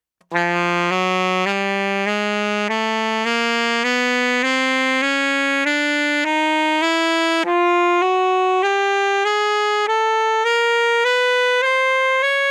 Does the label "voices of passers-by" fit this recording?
No